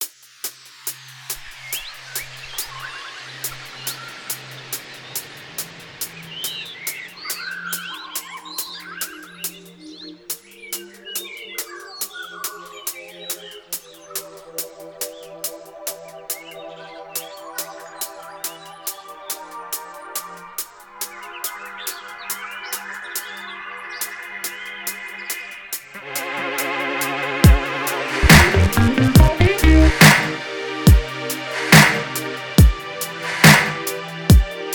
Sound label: Forest Funk